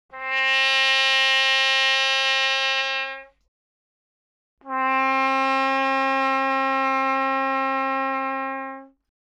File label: Trumpet; 気鳴楽器; LipReed; Trumpet+Cornet